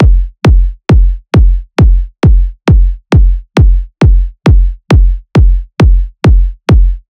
Kick Loop 135, Techno Drums

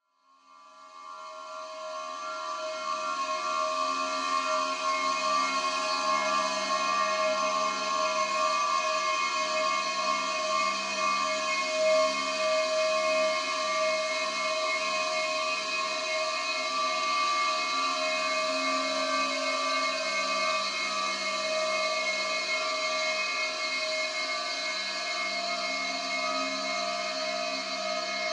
Ambience

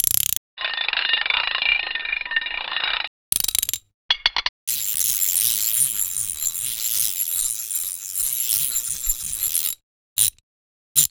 rattle mix, Cartoon Film Effects

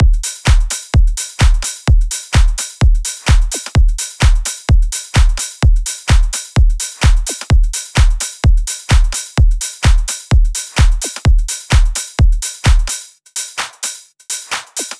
Drum Loop, 128 BPM; House; Full Drum Loops; Cymatics

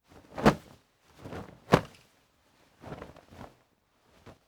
Bed Unrolling Sheets; Fairlight Sound Lib

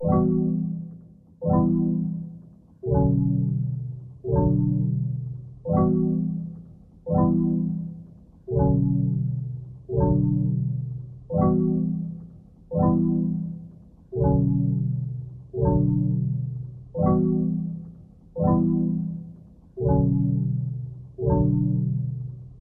85 BPM B Min Kalimba; Loop Stems; Cymatics; Potion

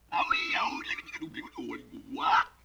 LoFi Synthetic Squawk

